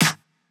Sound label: Clap, Drum Percussion Single Hits